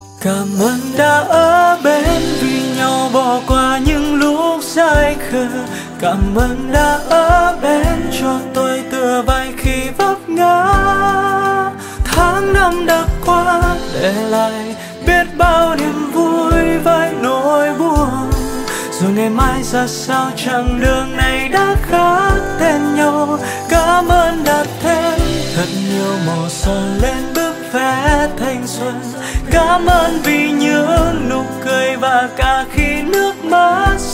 nhac chuong

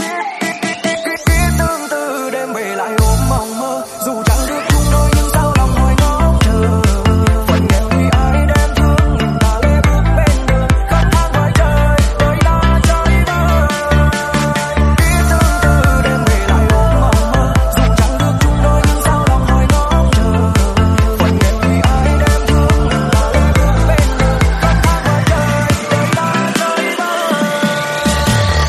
Nhac Chuong Remix

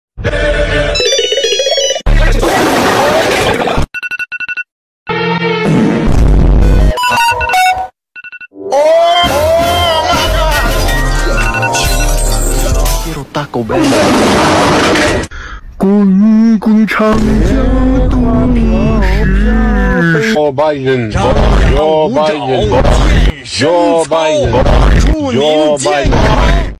bao thuc android remix

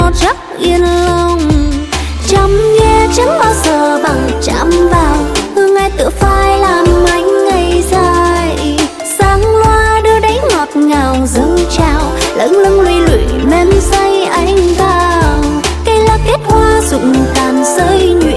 Nhac Chuong

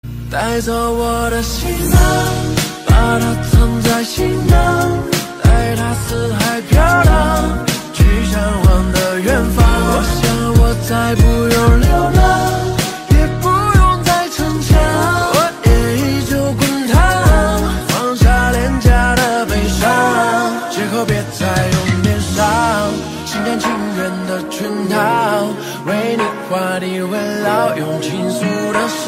nhac hoa tieng trung quoc

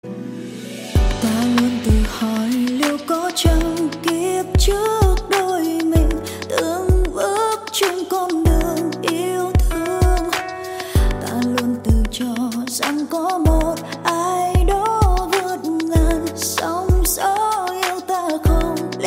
nhac chuong remix

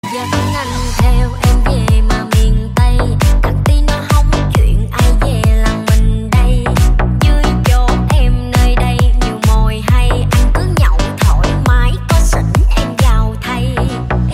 nhac chuong remix

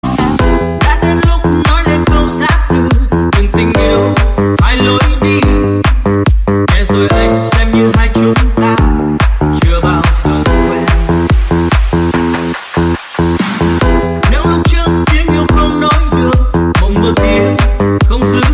Nhac Chuong Remix

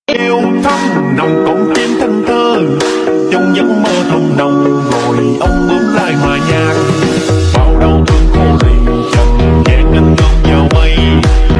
Nhac Chuong Slowed Tiktok

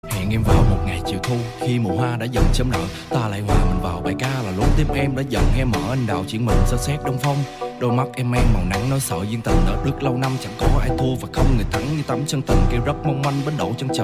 nhac chuong rap

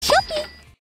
Nhac chuong thong bao; Shopee

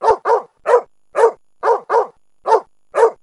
Tieng cho sua; nhac dong vat

